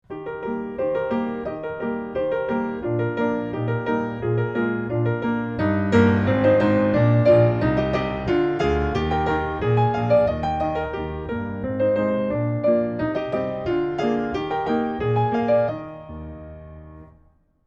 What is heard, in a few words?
Scottish Dance; Beethoven